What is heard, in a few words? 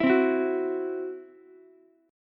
E Suspended 2nd Sharp 5th, Ukulele; Esus2#5 strummed